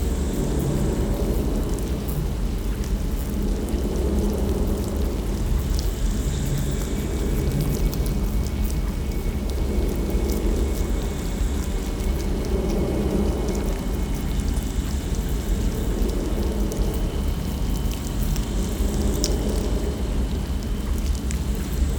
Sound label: amb crisis prethoryn swarm; ambient; System VFX